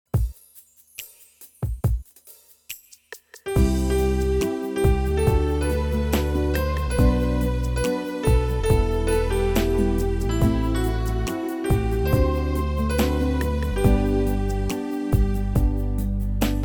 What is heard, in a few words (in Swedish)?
Instrumental Backgrund